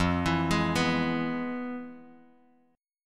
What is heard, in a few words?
F Minor Major 7th Flat 5th Bass Standard; FmM7b5 arpeggiated; JGuitar